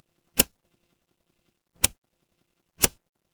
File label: mechanical switch